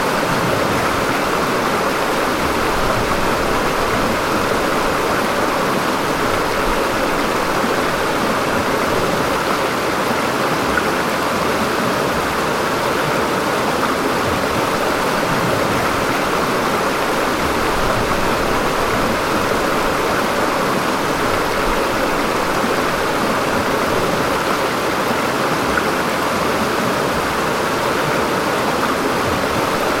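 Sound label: noise of water running over waves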